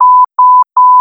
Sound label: alarm; С-Платформа. Инсталляция ПК